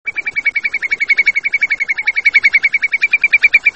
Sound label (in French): Courlis Corlieu; Scolopacides; Le Courlis Corlieu; Oisellerie Galan